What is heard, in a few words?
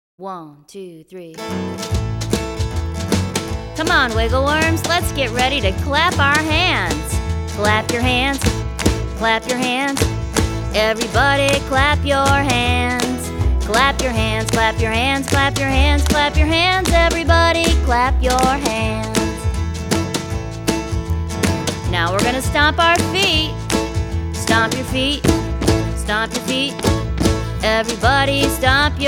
Clap Your Hands